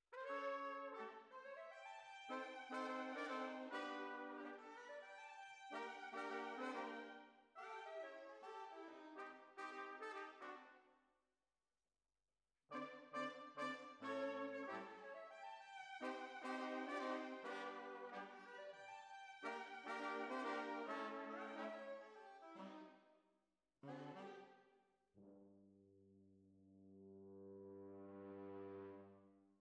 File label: Horns